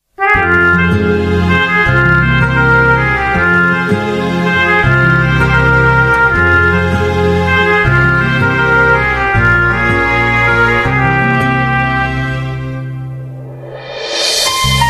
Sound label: cute love bgm ringtone; ethirneechal